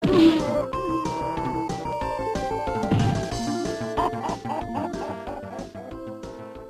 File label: uhho uhho uhho